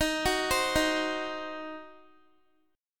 D sharp Minor 6th D sharp down Guitar; arpeggiated

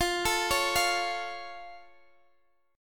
F Augmented, Guitar, DADGAD

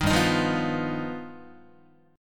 G Augmented 7th, Guitar; G+7 strummed; 6,3,6,3,3,4; JGuitar

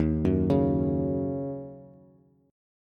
Eb Minor 7th Eb Bass; arpeggiated

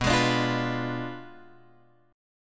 B Diminished 7th B, guitar; Bdim7 Chord {7 X 6 7 6 7} strummed

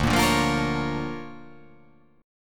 D Minor Major 7th Sharp 5th, D

